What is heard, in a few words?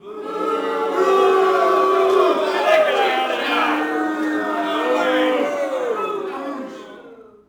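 boxing crowd small boo; SFX Kit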